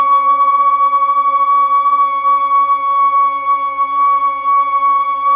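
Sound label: Fem C sharp 3, Mellotron Female Choir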